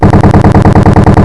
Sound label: Gun